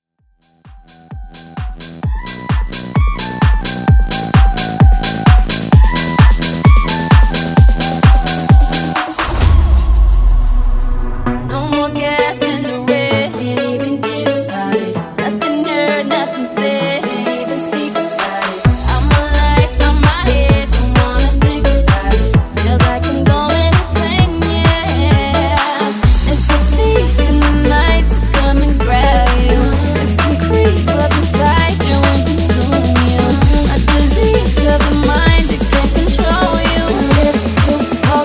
Mash Up

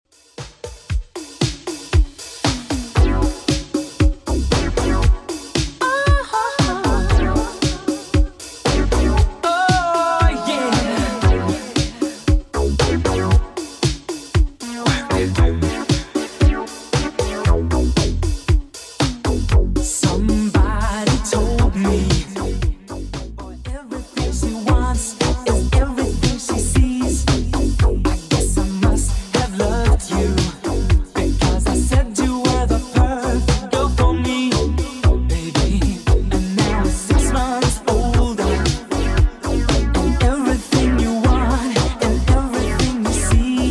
80s 116 bpm; Redrum

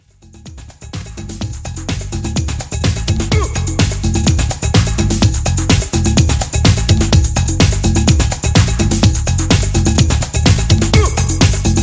Classic Hip House 126 Bpm

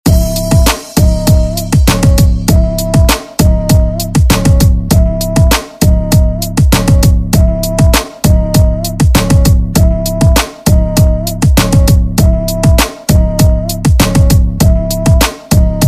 Intro Outro; Redrum

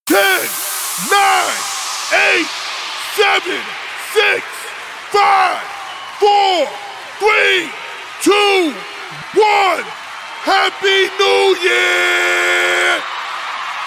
Countdown, 116

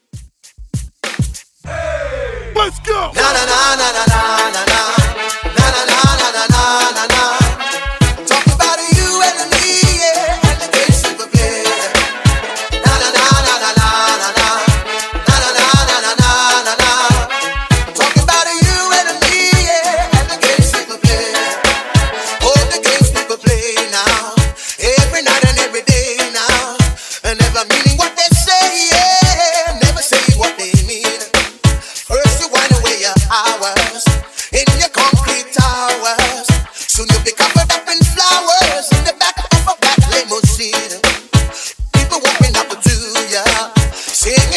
Reggae 90s Break Intro 98 BPM; ReDrum

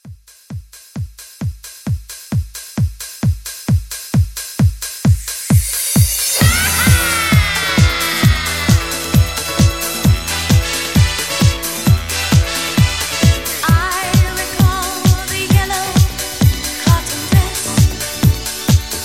70s Pop Re-Drum 132

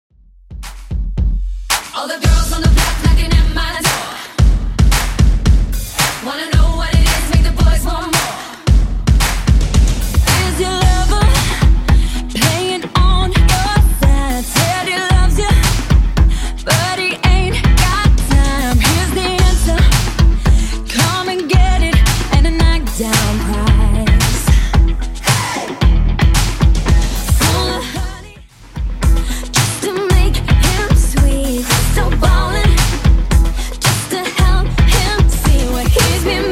Redrum 112bpm

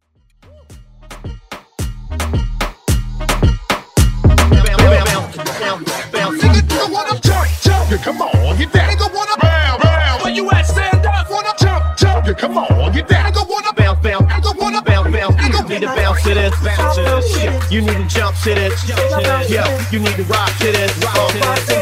Moombah Remix

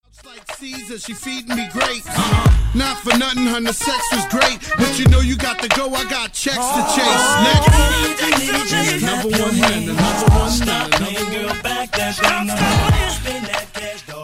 Throwback HipHop RnB Megamix

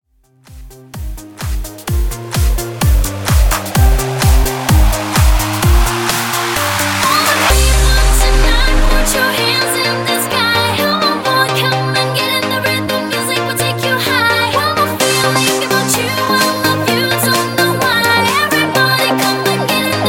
Dance Re Drum 128bpm

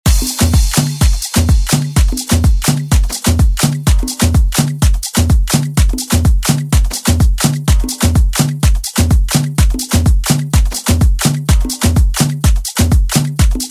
Afro House; DJ Mhark; ReDrum

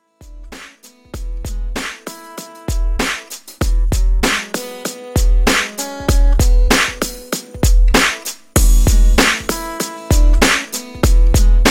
BoomBap Redrum 97Bpm

